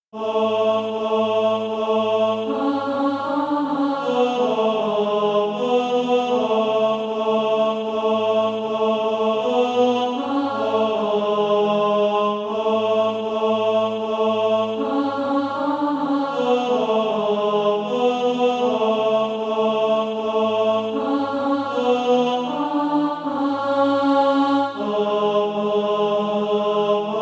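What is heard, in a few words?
tenor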